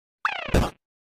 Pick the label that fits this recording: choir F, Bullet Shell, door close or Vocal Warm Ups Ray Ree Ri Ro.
door close